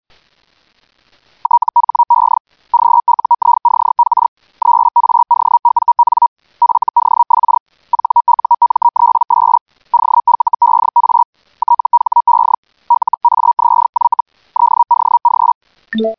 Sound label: radio